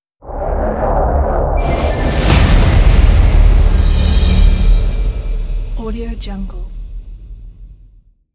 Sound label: logo electronic